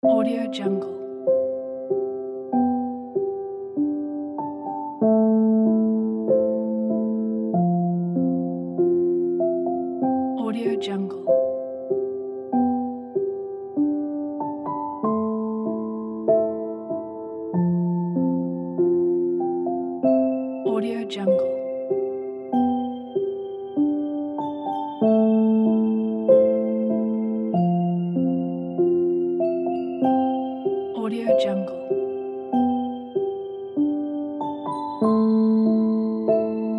lullaby piano

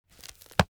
object book browse crackle

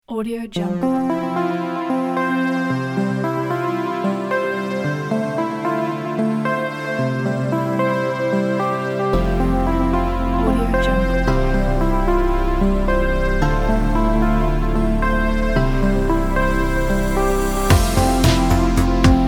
dreamy 80s synth pop ballad

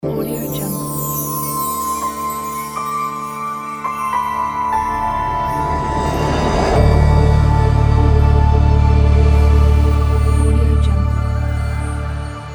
dreamy intro logo

